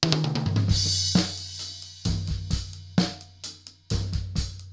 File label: drums